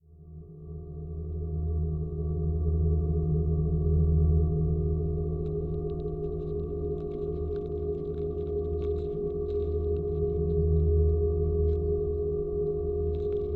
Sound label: estonian strings